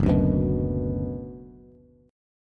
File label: Gb Suspended 4th Sharp 5th, Bass; strummed; JGuitar